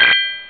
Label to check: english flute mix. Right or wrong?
Wrong